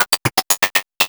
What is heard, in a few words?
POLYMOD; AMG - Vince Clarke Lucky Bastard